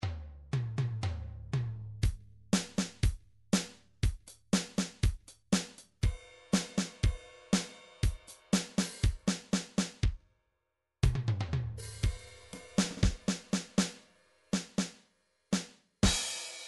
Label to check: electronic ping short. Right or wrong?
Wrong